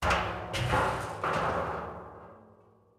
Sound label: loot barrel gib; Rust